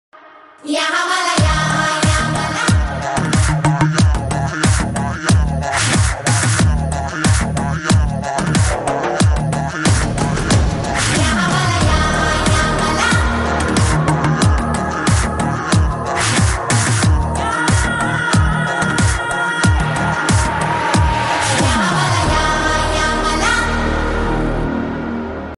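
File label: Mass background music ringtone; Kesari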